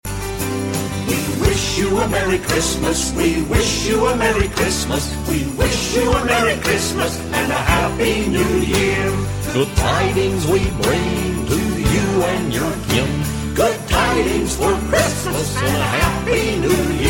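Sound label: wish u merry christmas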